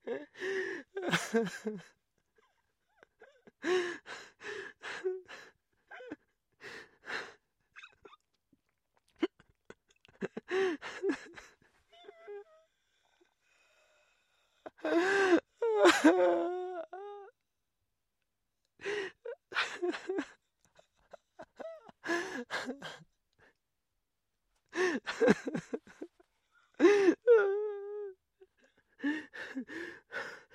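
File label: sounds of male crying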